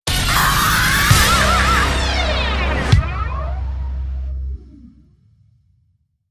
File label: Screamer Sting